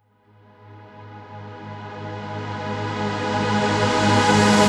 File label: Reverse Synths G#min, Effects; Vengeance - Essential Clubsounds Vol.4